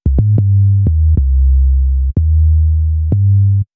Bass, Hip-Hop

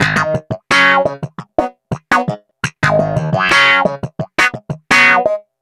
Wah Snap G# Rockin, Snap Bass; Basses

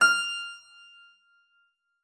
SPIN E6, SPINET; Xsample VOL-7

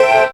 syn a, Dance Synths Stabs & Hooks; Best Service ProSamples vol.37

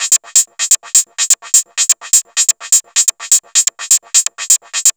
VCS beat; Zero-G Ambient 1; VCS BEATS #1

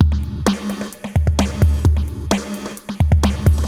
Moog Phaser HR-16B (130BPM), cyborg beats

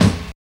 KICK 2; East West - Phat & Phunky CD2; 90's Sample CDs

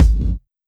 Kick, Drum Hits

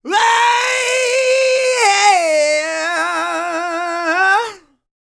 ROCK, MALE HUMAN; ILIO; Vocal Planet

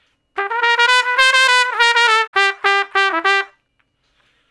Trumpet Shuffle (G); Crimson Blue and Fabulous Horncraft 4 RnB; Horncraft for R&B; Solos

